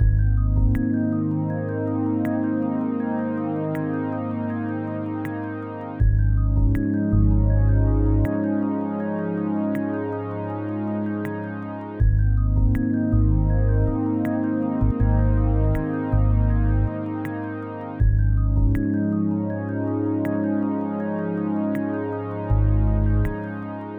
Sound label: loop; pseudOs